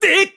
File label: Sword Shoot; Skyward Shot (charged energy) shouts; zelda